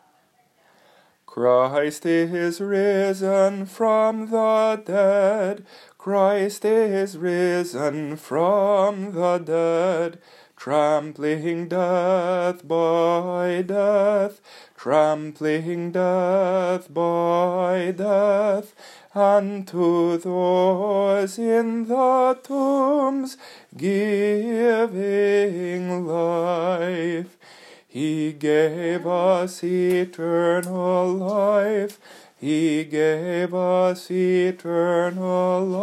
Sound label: Christ is Risen Folk